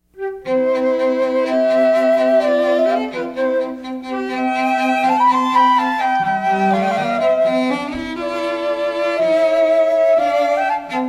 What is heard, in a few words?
Trio Strings; London; weddings